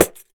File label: Pandeiro; Roland BK-5; Percussion